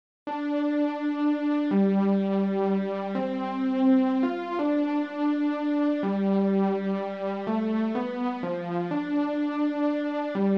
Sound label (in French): La danse des cloches